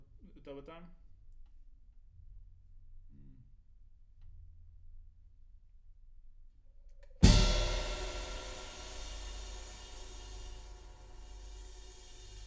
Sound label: Room A